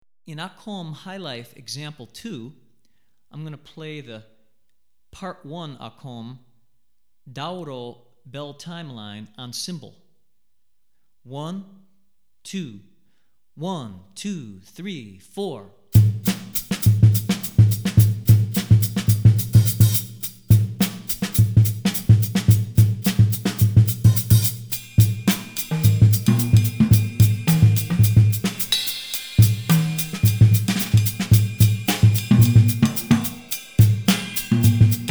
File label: Highlife Jazz, West African Rhythms For Drumset; Akom; Hartigan